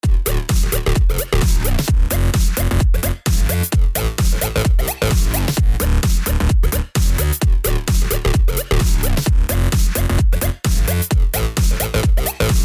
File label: vinyl scratch's bass